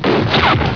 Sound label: gun; Bladerunner